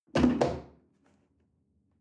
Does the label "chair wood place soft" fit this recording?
Yes